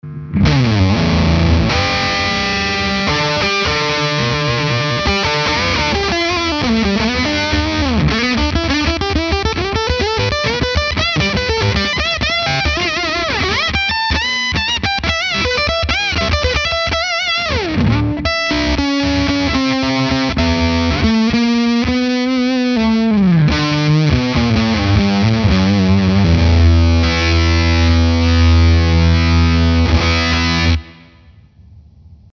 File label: Rise n Shine Lead; Stone Deaf